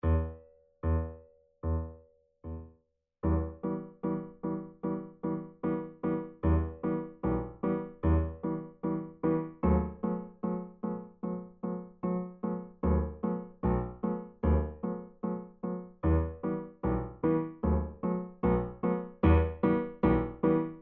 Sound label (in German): Secondo, Vierhaendige Uebungsstuecke; Diabelli